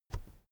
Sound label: keyboard external space push soft